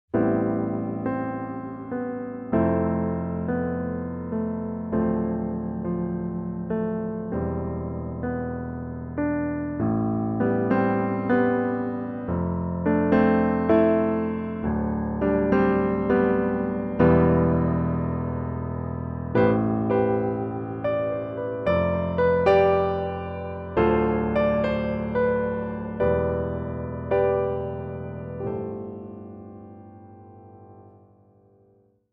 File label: Basic Chords